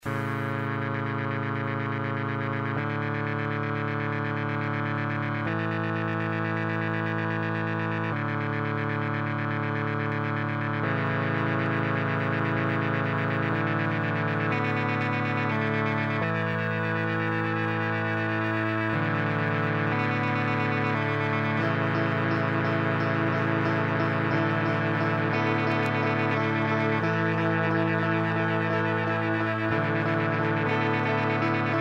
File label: noise; the Garden